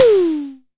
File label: Disco Tom - Long; Tama TS-206